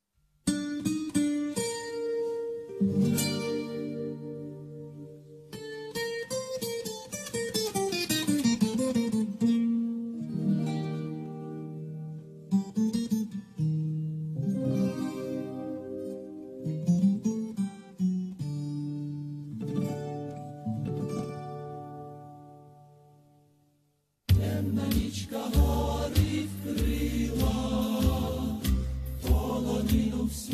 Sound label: Ukrainian tango; Yaroslav Barnych